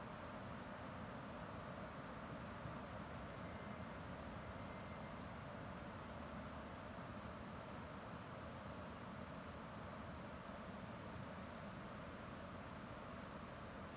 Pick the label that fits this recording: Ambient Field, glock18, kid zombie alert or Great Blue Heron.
Ambient Field